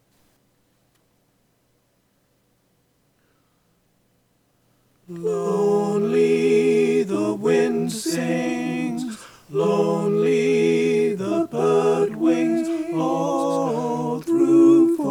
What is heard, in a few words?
sung ATB